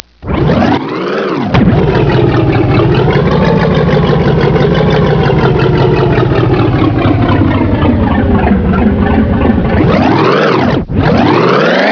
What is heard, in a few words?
car cough; english; vehicles; Pinnacle